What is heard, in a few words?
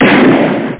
shotgun